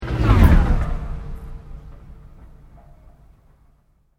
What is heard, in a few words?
Sweep Mechanical Slow